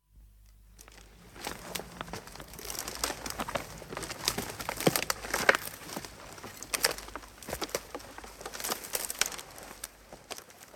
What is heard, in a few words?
Crawl Undergrowth; the 39 steps